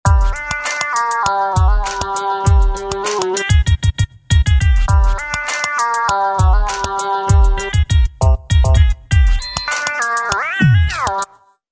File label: Roland V-Synth; Mystic Rap; Factory Patches